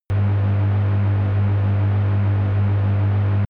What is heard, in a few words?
spaceship engine on load tonal; raumschiff